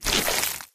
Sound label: human form short move; flood